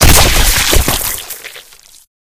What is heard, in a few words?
gibbed